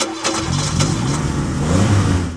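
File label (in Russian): звук заведенного двигателя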